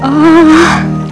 uhhh

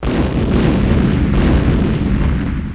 boom

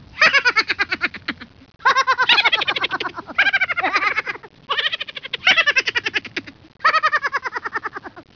laugh

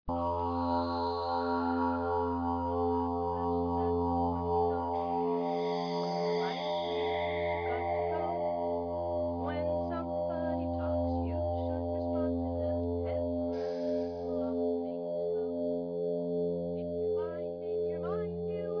Space Music

